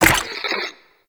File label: Play Next Video; Warframe; NineteenNinetyNine; Stage6TheCatCameBack